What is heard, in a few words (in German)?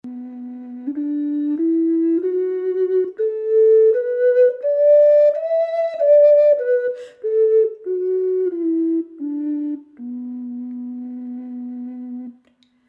Indianisch Bass H, ocarina; Indianische Ocarina